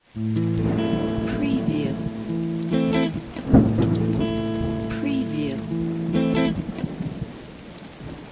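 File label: Rhythm & Arpeggio 4-4 Am Clean 70BPM, electric guitar; beatcraftpro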